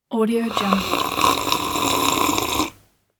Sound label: sipping